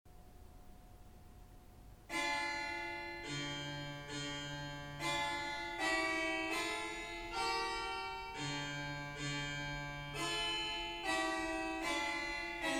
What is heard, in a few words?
Bach Italian Concerto harpsichord